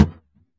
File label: bounce, basketball